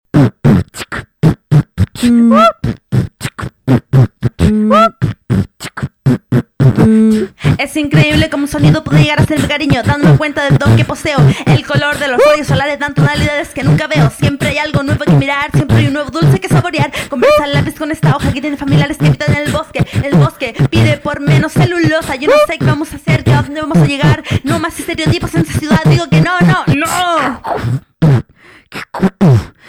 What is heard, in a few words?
Vocal work